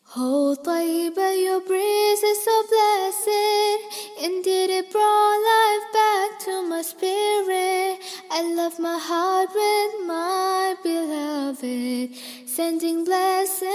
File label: English Naat Ringtone